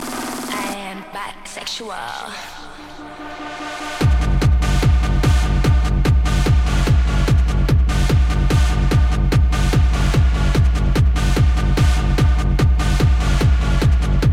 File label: Techno Voice